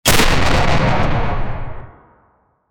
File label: assault rifle